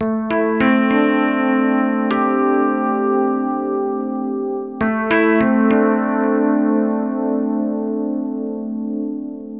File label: Electric Piano, Loops, Keys